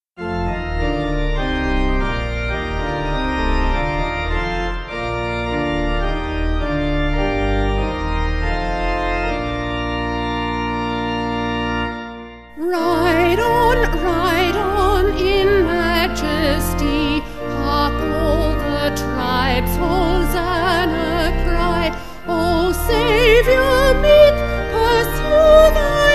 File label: Vocal Organ; Winchester New